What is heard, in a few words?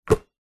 sport basketball catch two hands; zapsplat